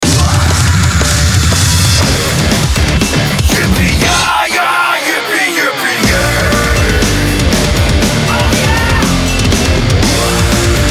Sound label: metal